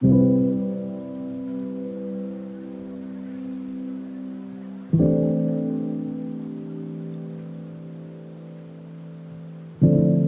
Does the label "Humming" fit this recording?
No